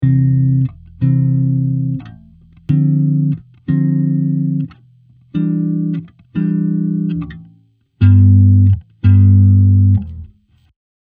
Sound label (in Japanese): ベースコード